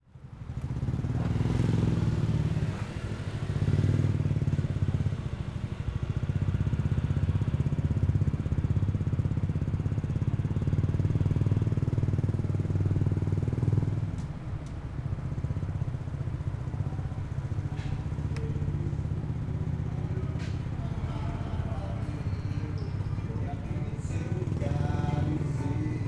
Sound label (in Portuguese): Moto andando pela cidade diverentes velocidades musica de bares; maquinas; colecao sonora do cerrado